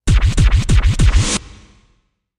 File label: Music Record Scratch, record players; Music Effect; Normal Record Scratch Sound.